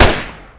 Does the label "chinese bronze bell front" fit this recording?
No